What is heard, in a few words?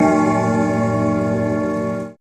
Clock Grandfather S, alarms